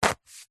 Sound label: foley foam block dense stab sharp knife